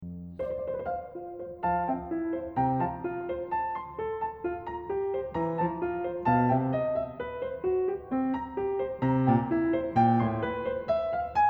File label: 12 Variations for Cello and Piano